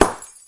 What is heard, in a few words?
boom